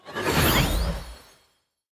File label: sentinel Wisps cast; heroes; nyru